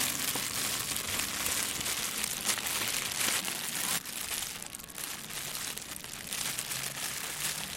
tuete raschelt

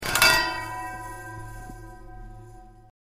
schlag auf eine heizung

